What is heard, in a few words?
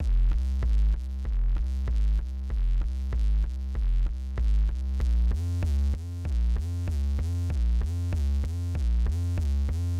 BASS, viral hiphop